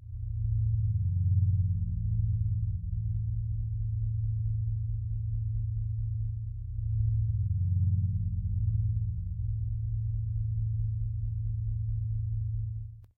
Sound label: ambient dark; STALKER